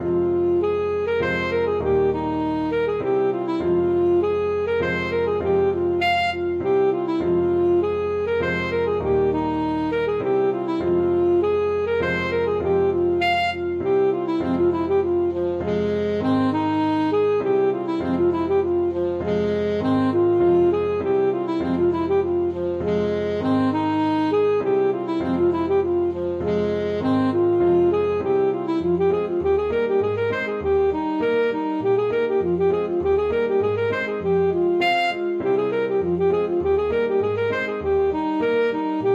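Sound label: alto saxophone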